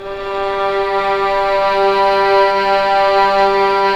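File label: violins; String Master Series; Vlns 6 p-mf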